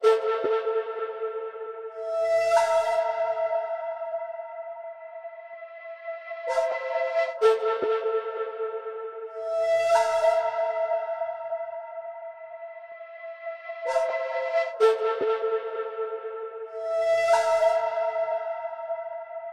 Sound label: 130 BPM A Min Flute; Melodies; Loop Stems; Melody Mystery Expansion; Smoke